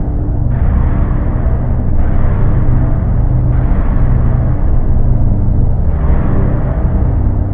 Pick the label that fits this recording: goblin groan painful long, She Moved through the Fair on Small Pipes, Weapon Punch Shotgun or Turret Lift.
Turret Lift